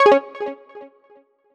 match leave; Minimal Weeb - Noel v1.3

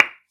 soft hit whistle; adidas for3v3r

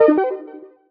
match leave

